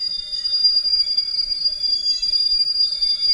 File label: Rub, Train; OpenBVE